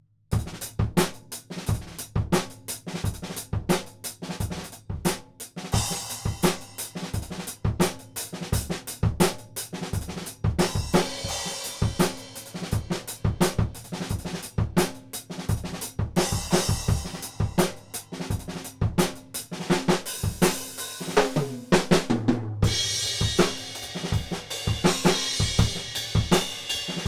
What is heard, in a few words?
Drum Room C12